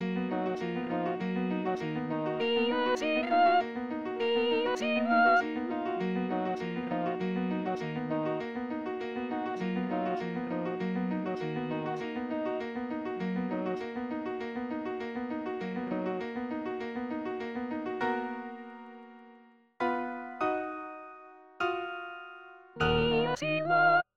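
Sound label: soprano; requiem; Dies Irae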